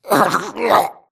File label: grunt electro; Titanfall 2